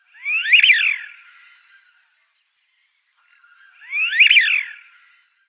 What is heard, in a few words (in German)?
Nordamerikanische Pfeifente Anas americana